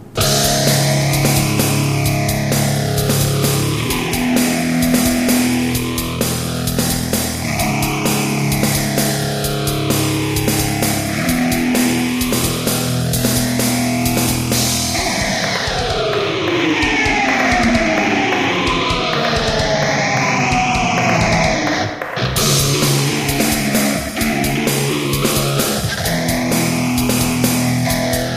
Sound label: alien fight; sven; alpha unit